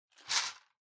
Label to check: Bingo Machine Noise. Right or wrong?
Right